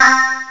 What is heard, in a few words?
ahh vox; Epic Collection 3, The; Epic Marketing; muzak